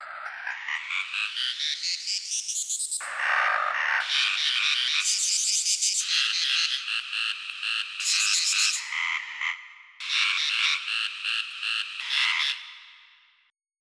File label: Cicadas; croc